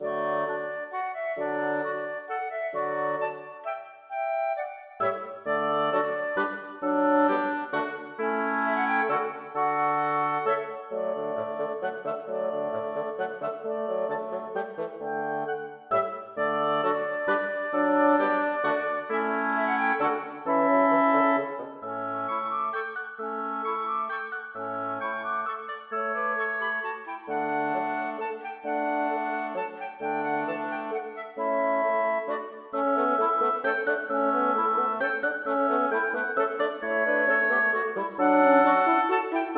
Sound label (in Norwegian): Menuet for blåsekvintett